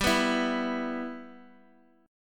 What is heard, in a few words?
E 5th G, E5/G chord; strummed; JGuitar